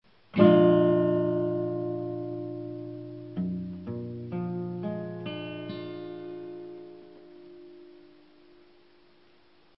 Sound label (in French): Accord A diese 7